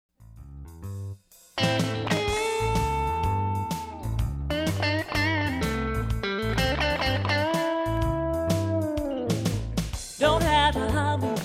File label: solo